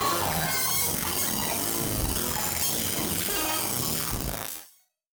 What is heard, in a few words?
Malfo Syn, malfunctioning synth